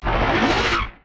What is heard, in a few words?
ammo feeder oneshot